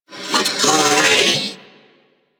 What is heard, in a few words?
Purgatory Warrior Possess Scream; Warframe